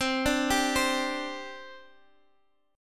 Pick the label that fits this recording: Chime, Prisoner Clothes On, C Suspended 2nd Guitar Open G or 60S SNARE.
C Suspended 2nd Guitar Open G